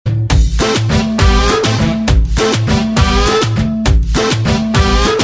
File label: Flute; Rowdy Rathode